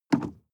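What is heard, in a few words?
sports car interior pedal gas step medium; verkehr transport; autos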